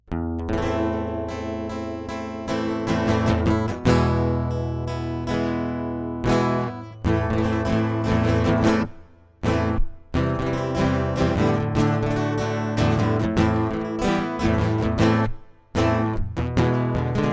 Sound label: Bullfight acoustic